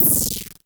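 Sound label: Klone Dual Percussion Synthesiser noise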